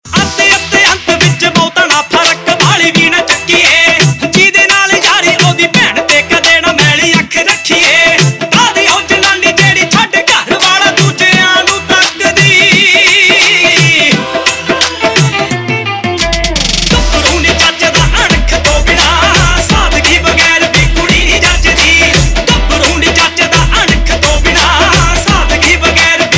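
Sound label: Ringtone, Punjabi Pop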